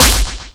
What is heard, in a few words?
Clap, Roland JX-305